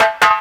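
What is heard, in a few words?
snare roll, Ragga & Dub Reggae; USB Soundscan vol.27; AKAI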